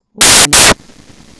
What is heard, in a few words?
warning; BrushedMetal-Tigert